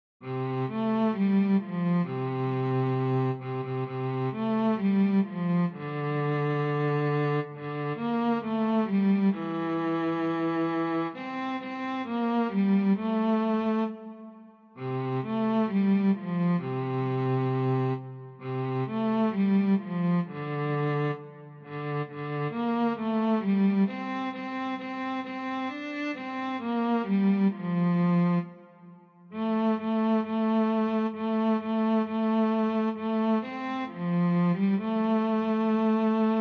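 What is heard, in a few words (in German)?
solo Jingle Bells Violoncello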